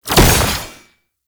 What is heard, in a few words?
Fist Claw Spike Despawn; Weapons; Warframe; Tenno; Incarnon